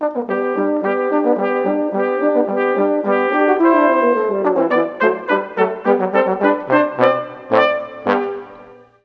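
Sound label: horn trombone trumpet; Juilliard Music Adventure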